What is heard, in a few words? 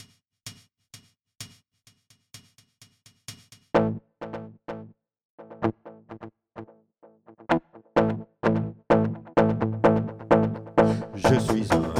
pop rock 128bpm